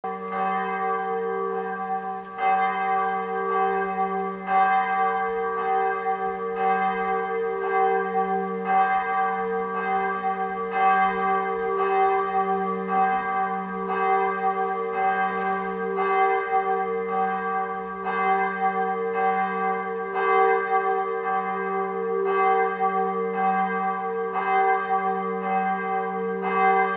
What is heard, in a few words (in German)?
Glocke; dom st martin rottenburg